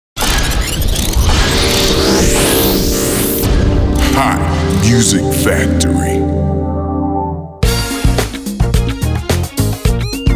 soul funky

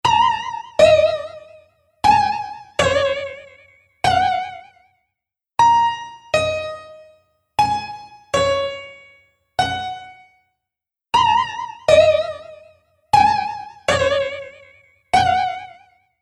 instant flanger mk ii

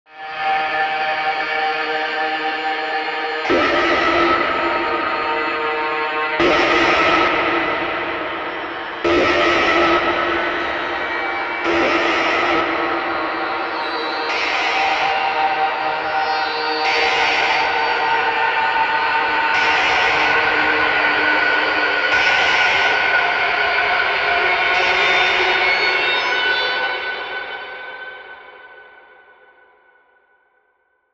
drone

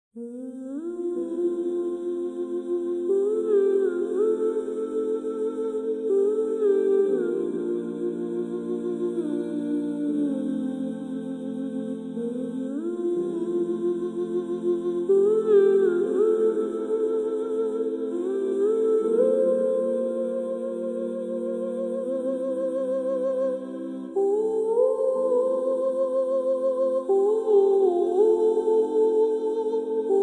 vocalise

